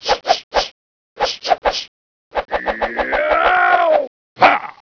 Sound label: man boxxing